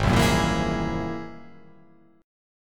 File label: G sharp Augmented 9th G sharp